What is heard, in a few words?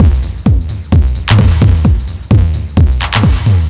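drum loop 130, left; House; Best Service ProSamples vol.10 - House; AKAI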